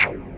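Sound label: hollow percussion; Pro-Rec - Synthsonic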